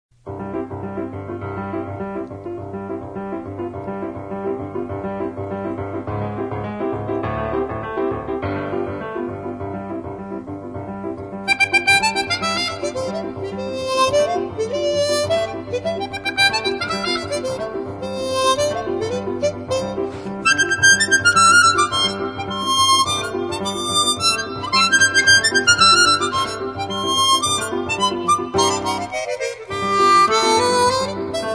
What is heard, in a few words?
Rumba